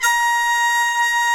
mute flute, hybrid winds

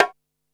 KOMMERZDRUMS; Soundengine - CSR-000 The Art of Transwaves

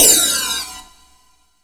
PROCYMB, CYMBALS

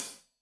Hat Loose, Hi-Hat, Cymbals Stereo

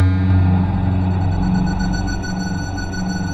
mystery pad; Optical Media International; Sonic Images Library; Top Secret

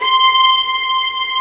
VIOLIN C4; STRINGS #2

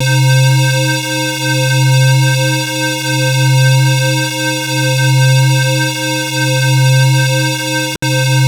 Old Rave; AMG - Prototype Drum & Bass

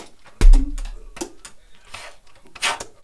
SAX PADS; E-MU Formula 4000 Series Vol. 1; Hip Hop Nation; Booty Brass

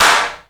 BIG CLAP

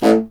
C. Bassoon, solo instruments

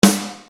Snare Dr

